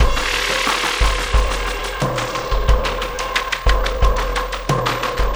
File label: Ripple Perc